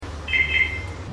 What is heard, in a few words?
alarme positron